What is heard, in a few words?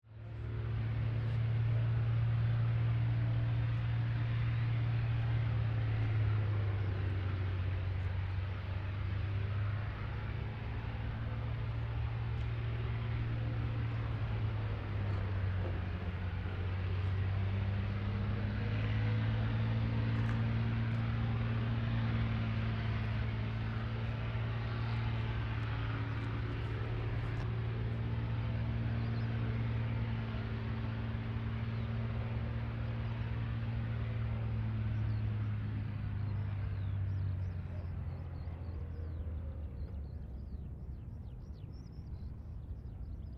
AIRCRAFT CAC CA-18 MUSTANG MK23 PREFLIGHT DISTANT STEREO; CA-18 /P-51 Mustang; Airplanes; Sound Effects:Vehicles